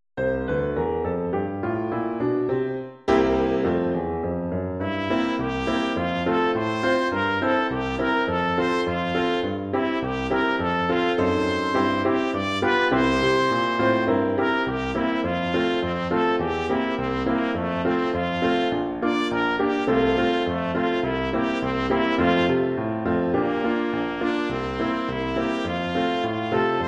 pour trompette et piano; trompette ou cornet; oeuvres instrumentales

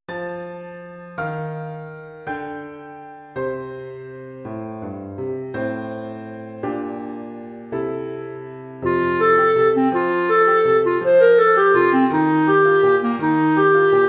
Carrousel anglais, pour clarinette et piano; oeuvres instrumentales

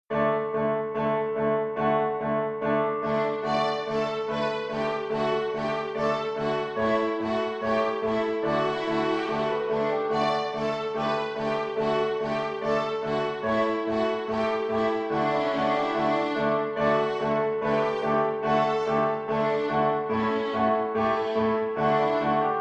tambourin

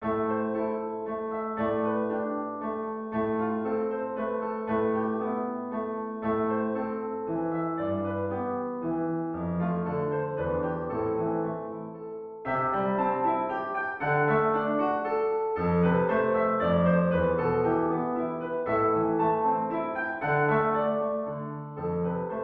Oeuvre pour piano solo; Editions Pierre Lafitan; Collection : "Plaisir de jouer"; Niveau : préparatoire

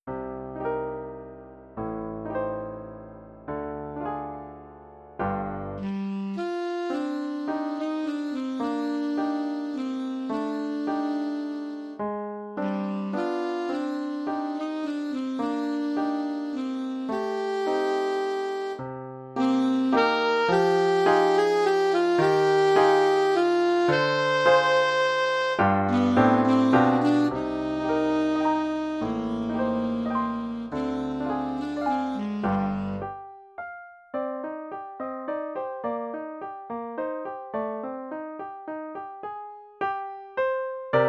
pour saxophone alto et piano; sax in wolsztyn; saxophone alto mib; oeuvres instrumentales

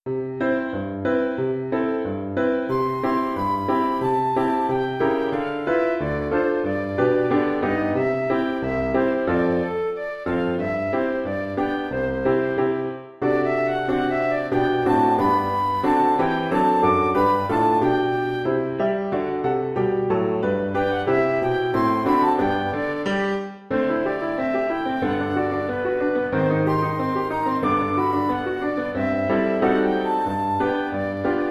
polka et menuet

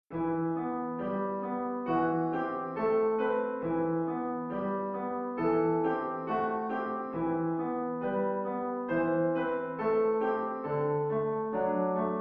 piano; ouvrages pedagogiques

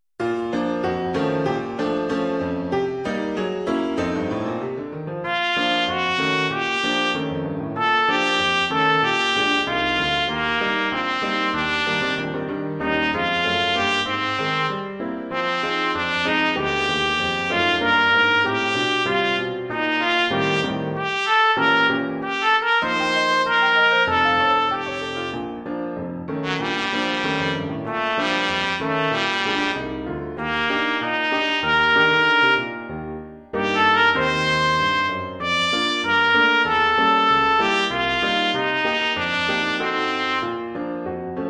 trompette

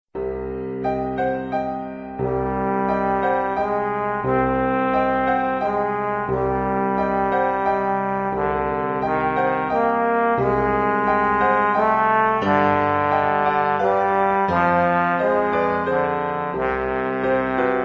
trombone oriental

